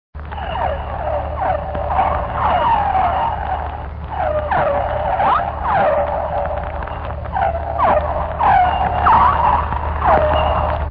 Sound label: beluga